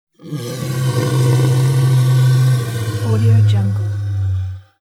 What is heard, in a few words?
creature monster inhale low